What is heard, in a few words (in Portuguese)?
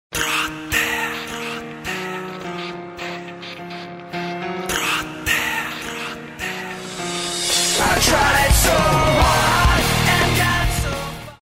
vinheta